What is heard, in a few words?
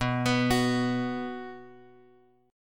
B 5th B, chord; B5 Chord {X 2 X X 0 2} arpeggiated